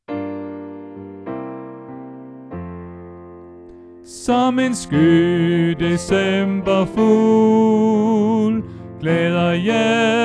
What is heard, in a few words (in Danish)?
Bas; oevefiler